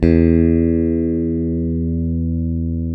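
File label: CLN STRAT, Synclavier, GUITARS; Sampler Library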